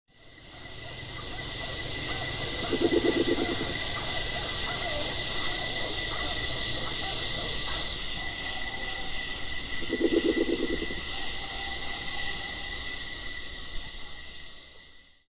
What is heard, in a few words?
Pulsatrix koeniswaldiana Murucututu de barriga amarela